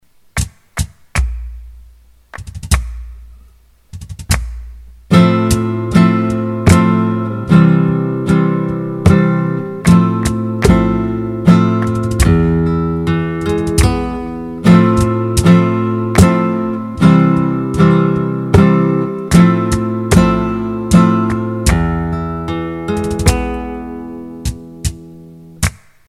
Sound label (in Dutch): Soleares compas basis